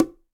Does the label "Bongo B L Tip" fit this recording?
Yes